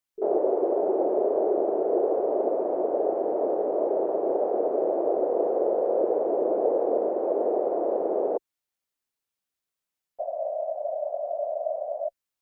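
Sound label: 400hz, 500hz, 630hz -20/-16db L/R [L 1/3 octave Band Noise, R 1/1 octave Band Noise]; Denon Audio Technical CD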